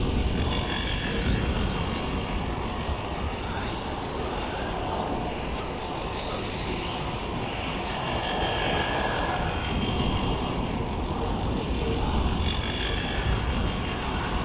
Black Smoke Ambient loop; adams venture origins

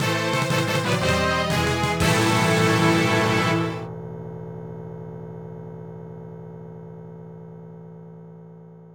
Despicable Tune; After Dark Flying Toaster HD

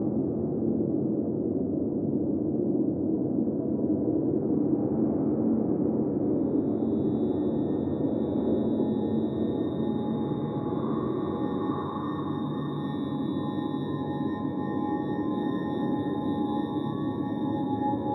ambience temple no bells

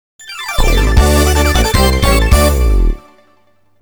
Battle Winner (WIN Jingle); bomberman online

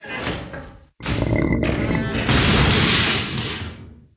Golem Death; Puzzle Chronicles; PS3; PSP; X360

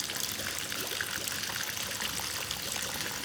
Ambience waterflow; adams venture origins

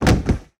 Death Body; portal quest android; Yoda

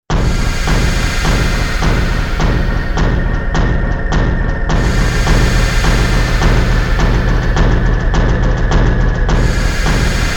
slower fight; cry of fear

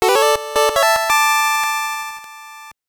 Jingle; Hello Kitty no Sweet Adventure Daniel-kun ni Aitai; 2000 gbc

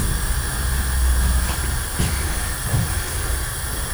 Gas

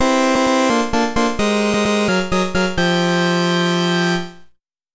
Battle Victory Fanfare; Sakura Note Ima ni Tsunagaru Mirai

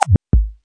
respawn second layer

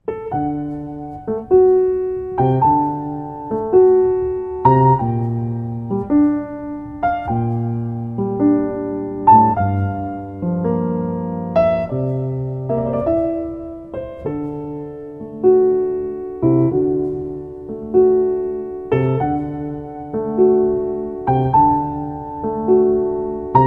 a piano's rythm; Napple Tale: Arsia in Daydream

